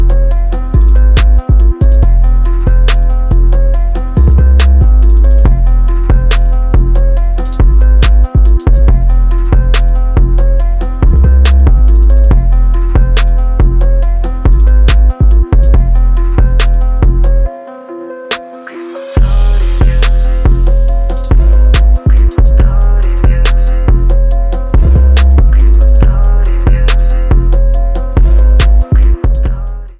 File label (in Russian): фонк